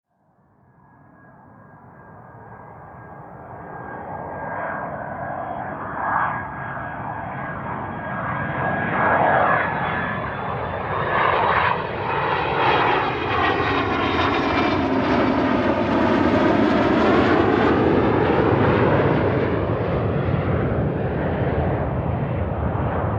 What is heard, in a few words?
Airplane Jet Fly By, A jet aircraft fly by in mid flight; Aircraft sounds; LYN109 package of Aircraft sounds; Airplane Sound Effects